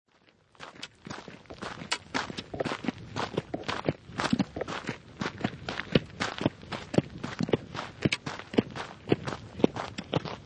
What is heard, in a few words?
Footsteps Two Persons Walking On Gravel; Footsteps of two persons taking a brisk walk on a country dirt